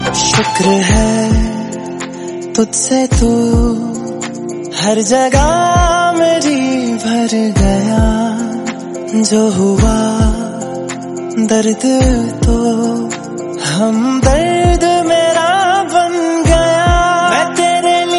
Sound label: romantic bollywood song tone ringtone